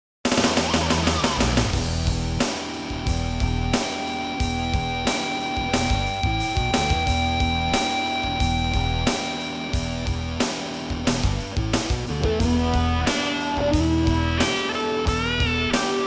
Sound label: Music- Acid Rock